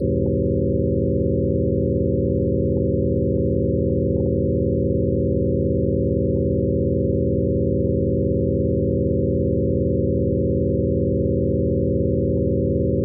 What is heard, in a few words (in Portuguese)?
acorde